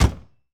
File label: Ferrari Dino Door Close, Automobiles